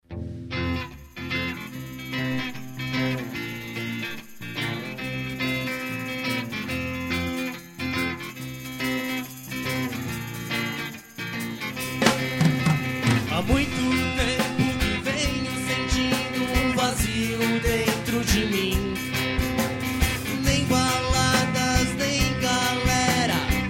reggae

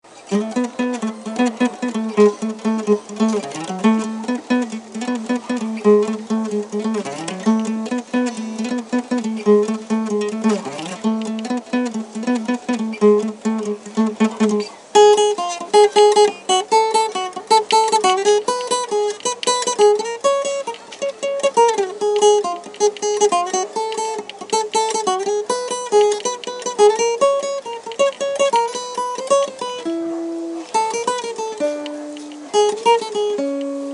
solo guitarra louca; guitarra complicada solo

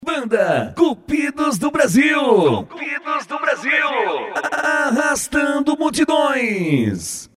vinheta; Cupidos do Brasil

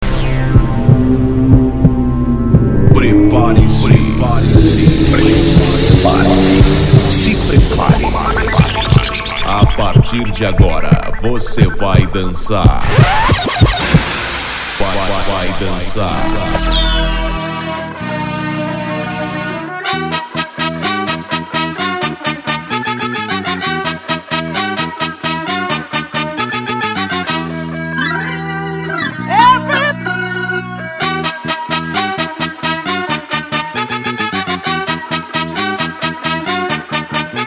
sirene; forca tatica

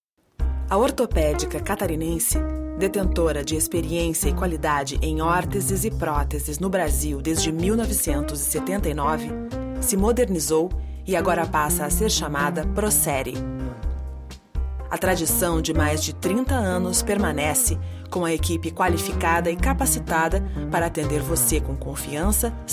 Espera telefonica; Procère; Criativa Produtora

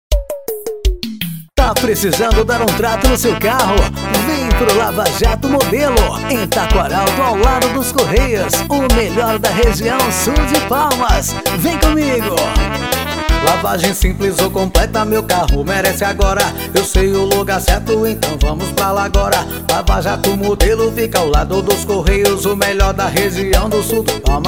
Modelo Jingle Pronto; jingles politicos; lava jato

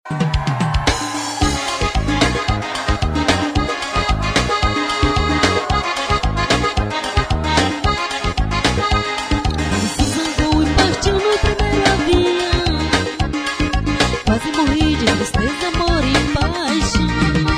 forro

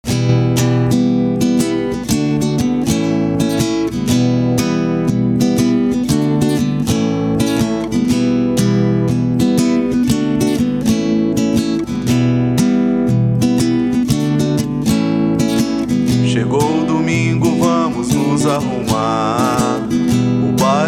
musicidio funk acustico

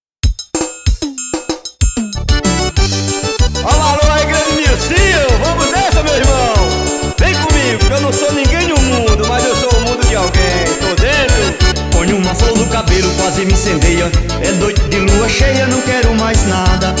brega

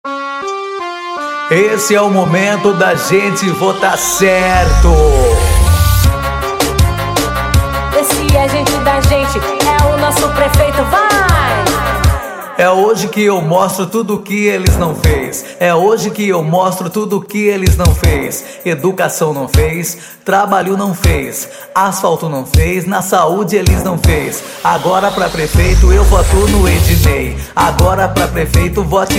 jingles politicos funk; jingles politicos 2020; para prefeito e vereador

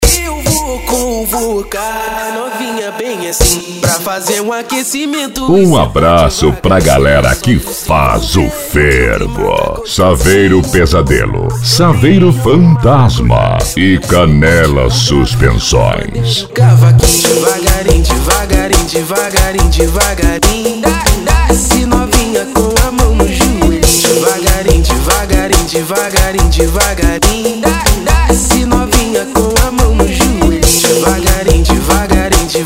eletronica; Paraná; Fervo 2016

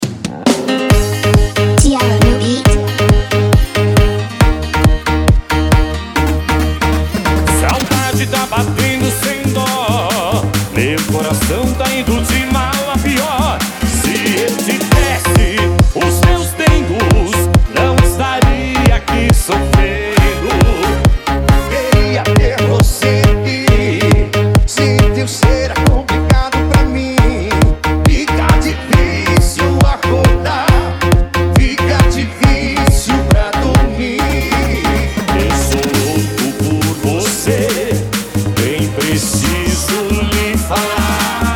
e fogo funk remix

